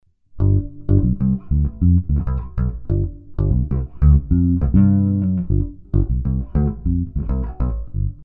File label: druid bass